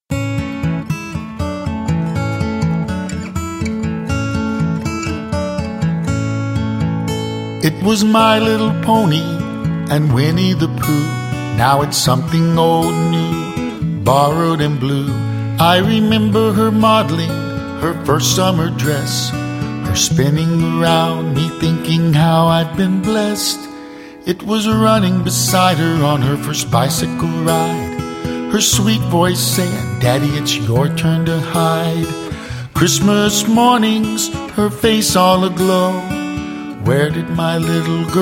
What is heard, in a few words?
Tropical Country